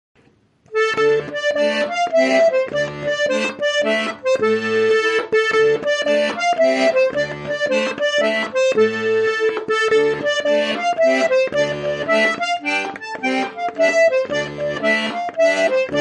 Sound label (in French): Gavotte version duo